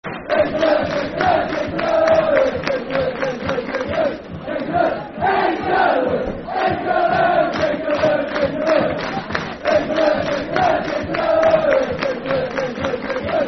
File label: England, England, England, Football Chant (Soccer Songs); Darlington Dfc